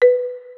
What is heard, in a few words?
marimba start; Handy